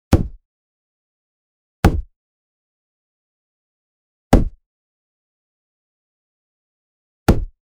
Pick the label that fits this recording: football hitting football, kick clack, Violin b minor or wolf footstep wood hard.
football hitting football